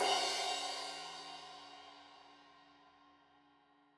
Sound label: Crash 15in L edge, Cymbals Stereo